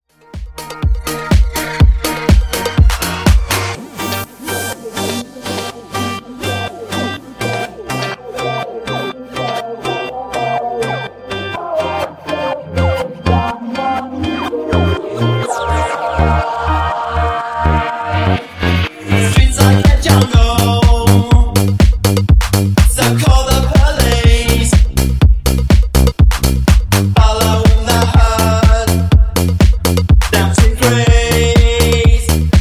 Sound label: Funk Bootleg 123Bpm